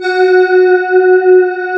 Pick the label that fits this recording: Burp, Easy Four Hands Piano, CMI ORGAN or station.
CMI ORGAN